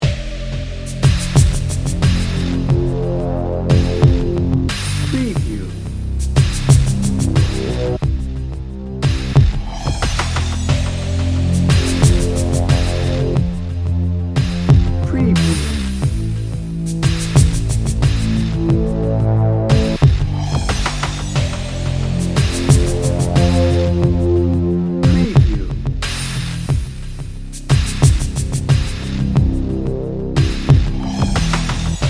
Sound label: dnb